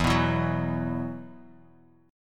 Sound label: E Suspended 2nd E, down, Bass Standard